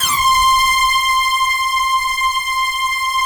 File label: SQUEAK C5, Yamaha VP-1; Music Store